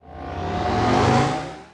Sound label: blast charge short; Apotheorasis